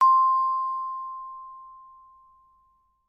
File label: Xylophone C